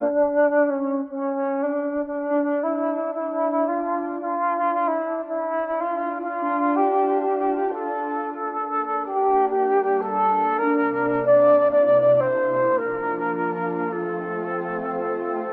flute, Sad

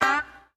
bluesy, Guitar; Notes

